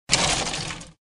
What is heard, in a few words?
Menu Down